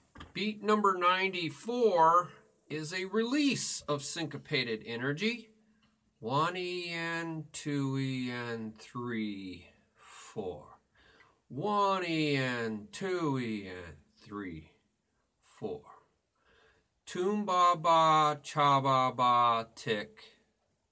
Beat 1e 2e 3 4 Toom Ba Ba Cha Ba Ba Ti Cha

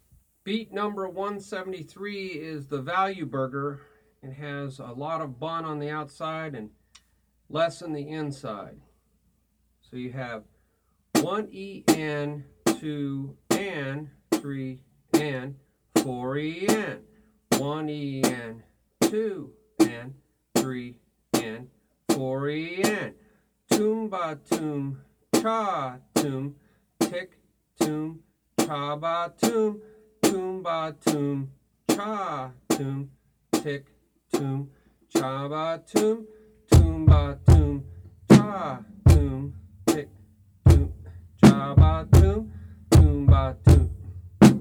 Beat Toom Ba Toom Cha Toom Ti Toom Cha Ba Toom

